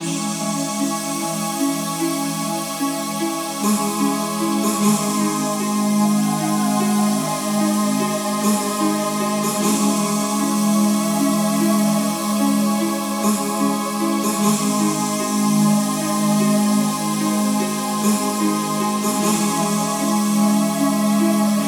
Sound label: music for relaxation; beethoven